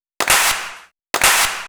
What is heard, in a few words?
Clap Mix, 128 BPM; Vengeance Samples; Vocal-Hook Kits; Song Kit